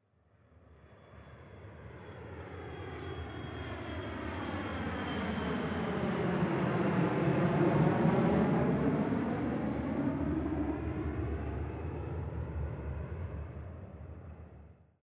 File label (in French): avion